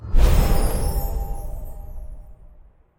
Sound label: place open